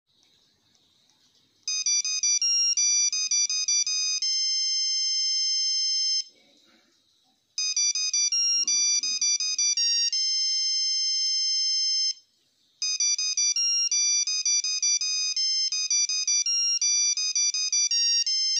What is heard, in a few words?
bgm playing on nokia keypad; vikram; kamal haasan